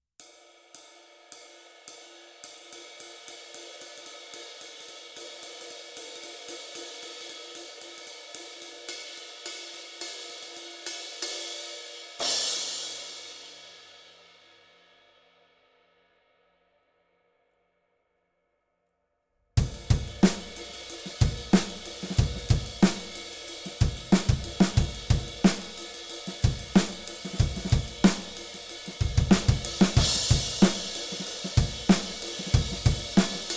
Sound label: Tradition Light Ride